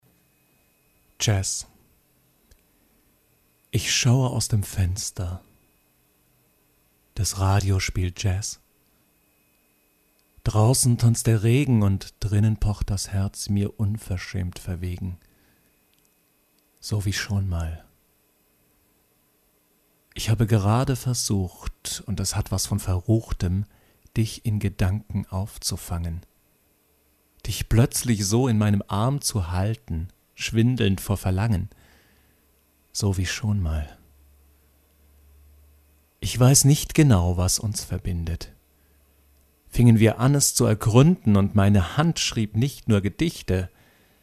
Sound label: Jazz